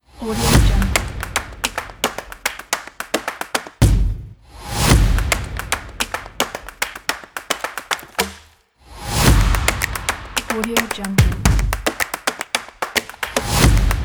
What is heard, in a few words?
stomp kit